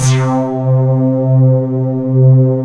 PAN TONES; Pro-Rec - Synthsonic; TRINITY

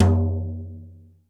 BRUSH TOM, DRY; Crypton - DrumBase5000; DRY KITS

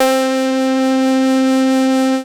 MOOG BASS C4; Best Service Dream Experience

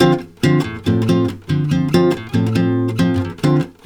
D MIN, Brazil Bossa; USB Soundscan; AKAI

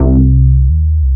bass, Roots Funk; USB Soundscan vol.41; AKAI

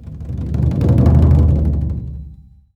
BD ROLL, DRUMS; Spectrasonics; Heart of Africa

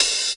HH, HIHAT HIP, Dance Mega Drums; Best Service

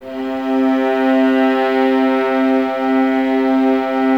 VLS C 3, Violas; Miroslav Vitous; VLS 1+2

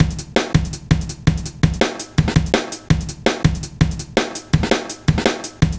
Heavy Live, Jungle, Drum Loops 165.5; Zero-G Jungle Warfare 1, 2 & 3

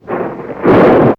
Sml Crak, Weather; Fairlight CMI IIe

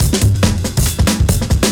Beat Style, 140bpm, breaks

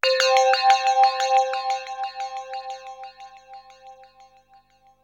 BELL ECHO; DRUMS & PERC

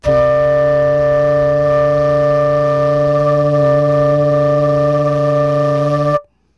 FL HUM D5, FLUTE HUM; Greytsounds - Philip Wolfe David Crozier's Saxophones

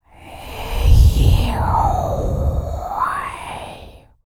VOX FX, BREATH FX

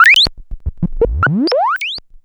ANALOG BLEEP, SCI FI ALARMS; Ambient; Zero-G; Ambient 1

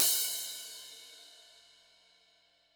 CYM; Bob Clearmountain Drums I

